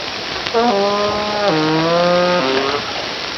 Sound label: 300 Hz FM Harmonic single